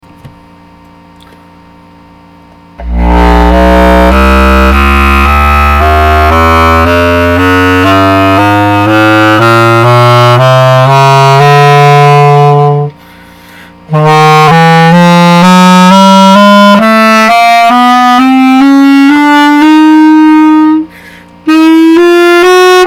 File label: bass test, clarinet